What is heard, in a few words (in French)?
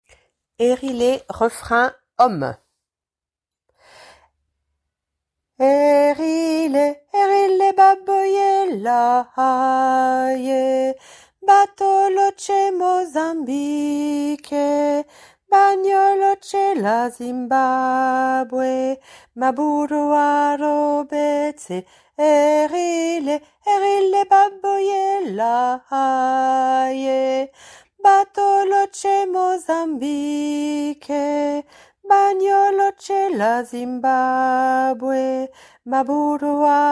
hommes